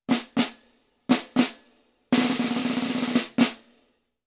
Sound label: Snare Hit and Rolls